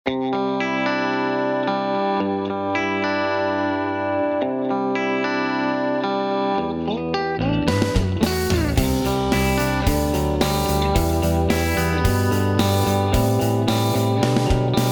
Duesenberg Outlaw MXR Dyna Comp Boss DD20 Vox AC30HH2 Jensen P12Q 8 Ohm; The Gig Rig Midi8